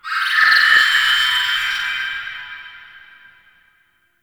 Guild High Tech; Warframe

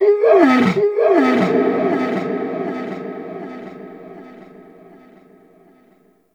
Outpost Creature; Corpus Outpost; Warframe

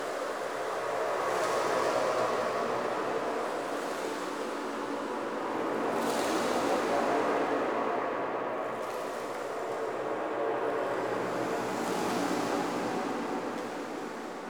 Landscape Tunnel Loop, Ambience; Orokin

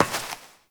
Step Metal; Warframe; Koumei

